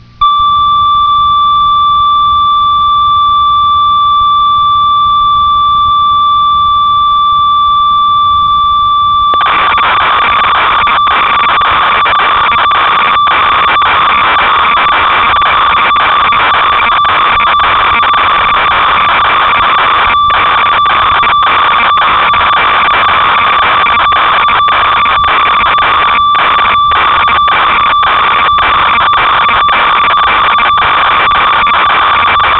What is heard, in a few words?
ob6, synth